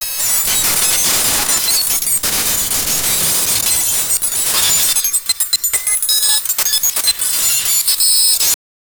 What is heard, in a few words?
cyberpunk barrier hologra